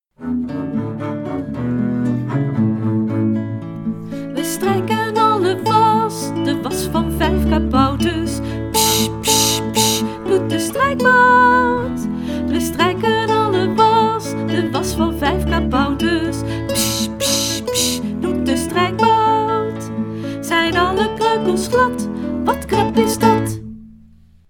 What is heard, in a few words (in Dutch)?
kabouterwas met zang